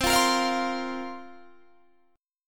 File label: C 7th Suspended 4th, Guitar, DADGAD